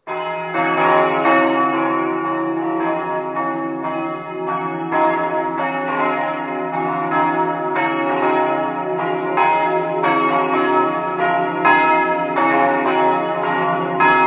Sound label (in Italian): campane; ronchi